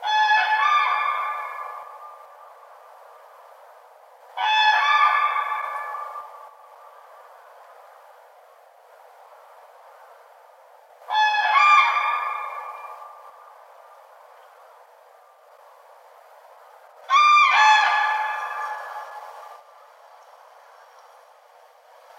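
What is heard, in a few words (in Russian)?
zvuki pary zhuravlej, pair of cranes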